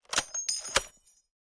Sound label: HEAVY MG BELT LINKS, movement